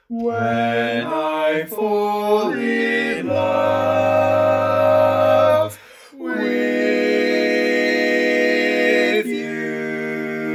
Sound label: All Parts, barbershop